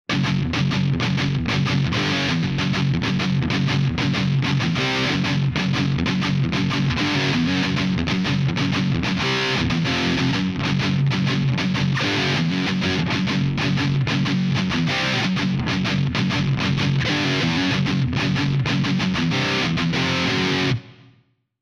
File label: chunk squared; linpodx3